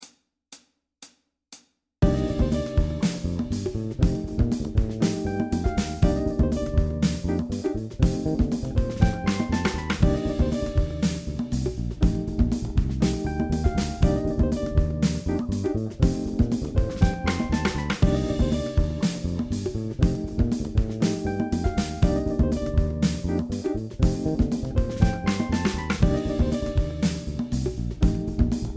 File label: groove